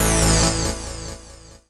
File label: KEY SHOT TEKNO 140 A; Techno2; Singleshots